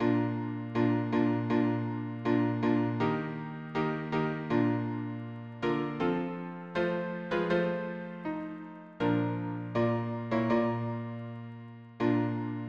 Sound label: harmony piano